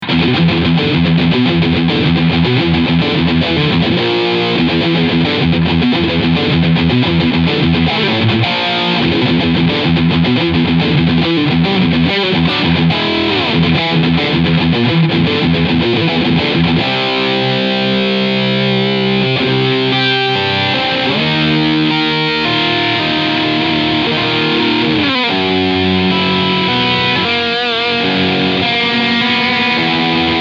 Seymour Duncan Diamondhead Verzerrer Pedal

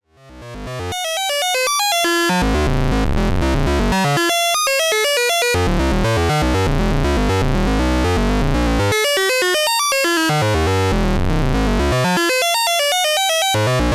Xaoc Devices Leibniz Binary Subsystem 16tel Sequenz aus LFO Inverter; über Jena

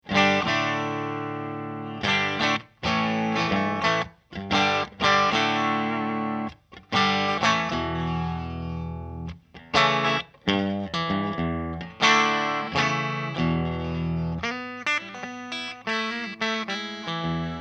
Koch TT clean offen

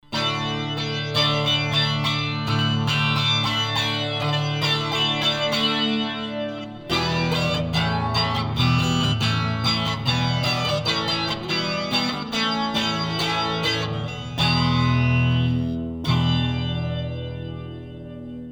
tbone rb770 e gitarre

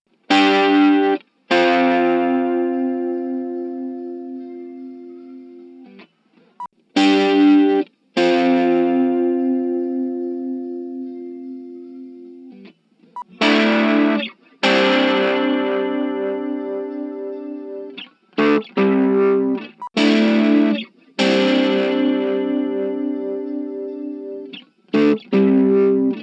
Gitarre A B; Golden Age EQ-81 MkIII

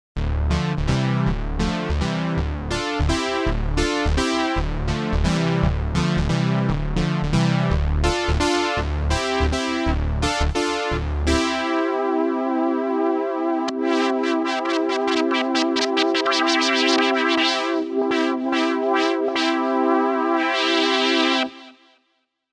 akkord korg z1

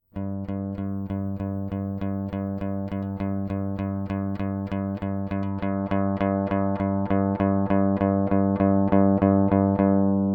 Bass Rickenbacker DI Signal HF Boost Stufenlos nach unten

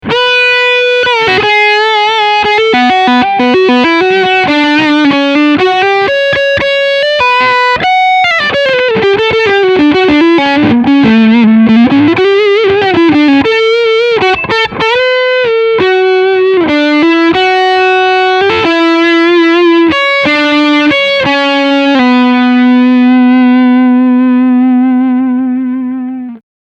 Steg PU Overdrive

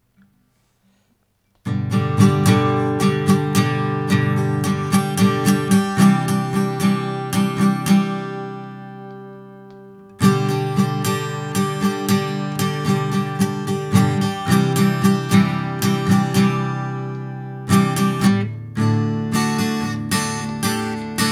Gitarre DYN; SonicFarm

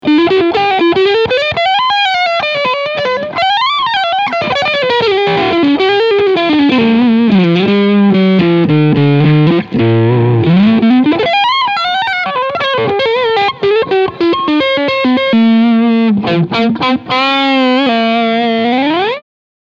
Mitte und Steg PUs Overdrive

